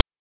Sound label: PITCHED NOISE; NES DPCM; MIDINES